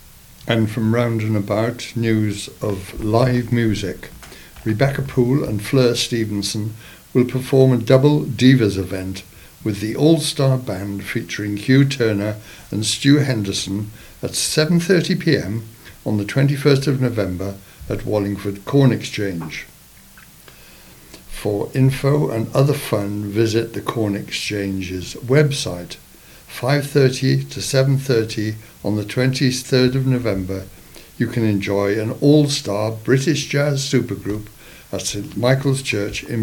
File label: Jazz concerts